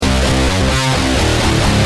G Pump, Guitar; Industrial

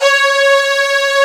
C#3 VIOLA; AKAI S6000 CD-ROM - Volume 1; Strings

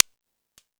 Timbale, E-MU Pro-Cussion; drum machine

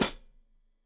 Hat Foot, Hi-Hat, Cymbals Stereo

